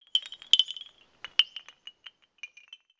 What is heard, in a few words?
Bamboo windchimes; ninjago